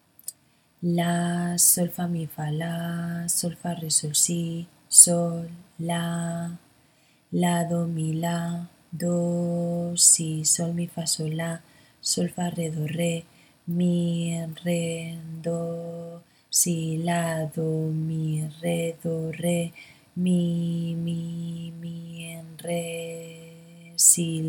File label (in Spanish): ritmo 6-4; Equivalencias